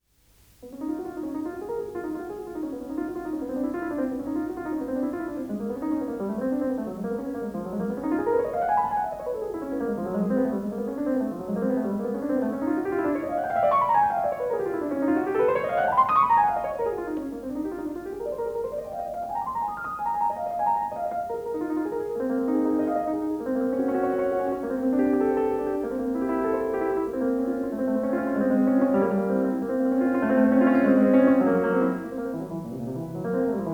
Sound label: Etudes Livre1; Debussy; Gieseking